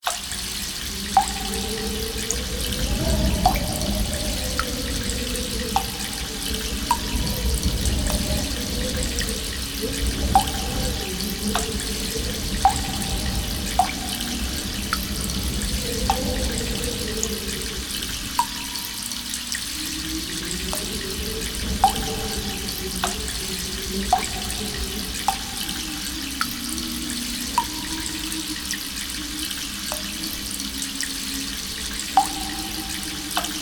ambience cave drips running water wind outside; sound effects one

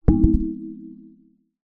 karimba down on soft surface; musical

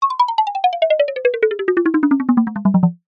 cartoon footsteps descending ladder; zapsplat

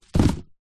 present wrapped box fall over; foley; zapsplat

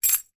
household keys bunch drop on concrete floor

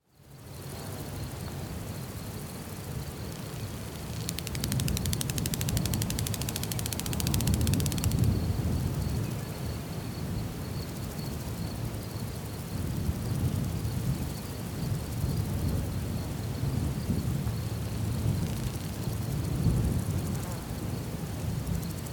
ambience countryside insects birds texas usa; felix blume

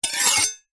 knife blade scrape draw metal fast

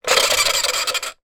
toy baby telephone push fast; fisher price; sound effects

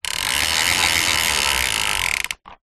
leisure toy car push wheels click

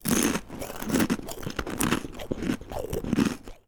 human eating rice cracker crunch

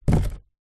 foley cardboard sheet drop down on cardboard box

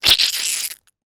human puss squeeze squirt

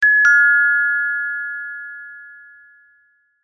doorbell chime ring; household

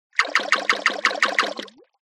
multimedia game designed water hits multiple

